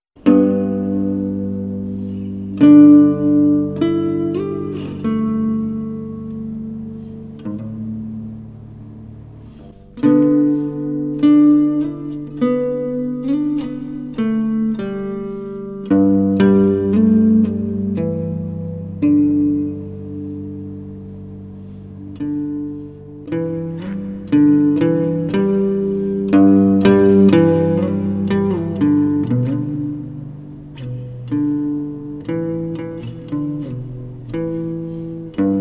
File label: ruan